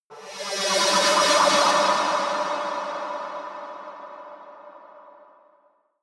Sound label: space alien spacecraft small fly long decay; raumschiff